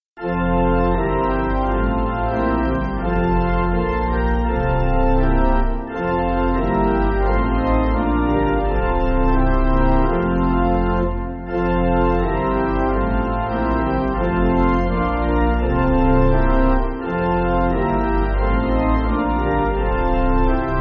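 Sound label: Pipe, Pipe Organ; Dwyfor 8.7.8.7.4.7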